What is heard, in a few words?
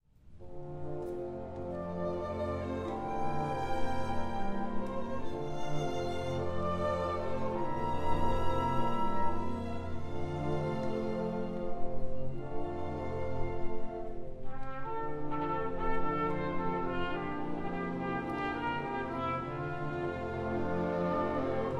trumpeter